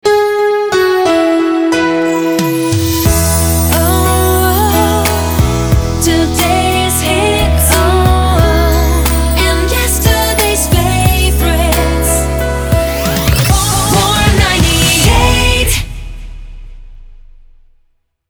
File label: Soft Hot 090 136bpm C Shotgun, jingle; Warm 98